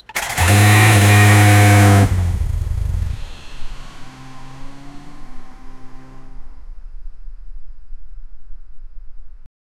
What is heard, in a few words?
bugatti chiron sport engi, car